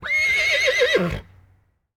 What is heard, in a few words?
Horse Whinny